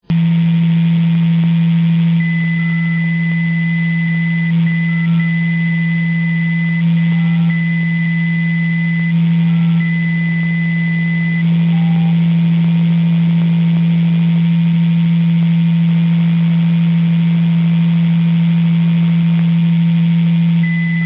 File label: decca, digital modes